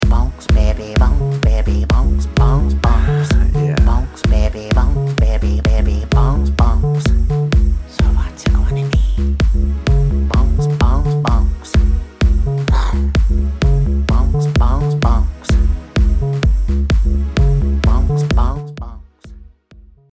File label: Ringtone remix